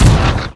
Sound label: torpedo fire; weapons; Stellaris